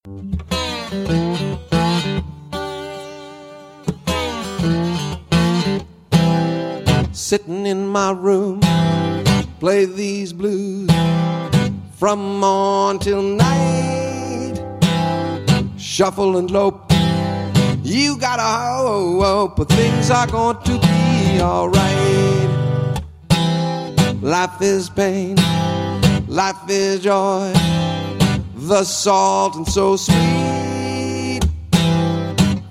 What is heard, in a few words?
great blues live